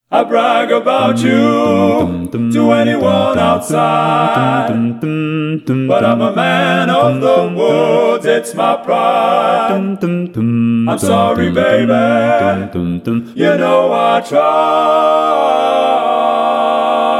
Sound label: All Parts, barbershop tags